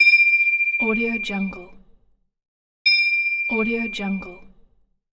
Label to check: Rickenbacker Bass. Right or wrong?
Wrong